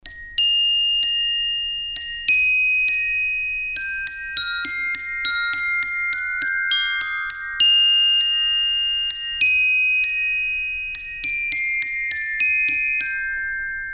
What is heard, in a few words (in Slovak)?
glockenspiel